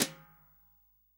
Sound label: SD, REAL DRUMS, SWING KIT